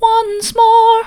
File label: once more soft, vocal